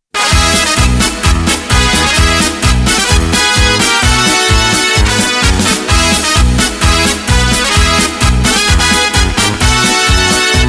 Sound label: Korg Pa3X